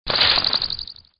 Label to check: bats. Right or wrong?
Right